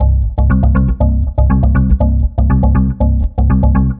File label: Groover Bass, Bass Foundations; Sonic Foundry (Sony Creative Software); The Electronic Music Manuscript Collection